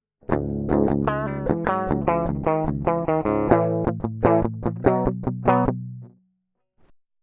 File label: Guitar Rig 5